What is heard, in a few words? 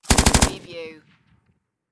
Machine Gun PKM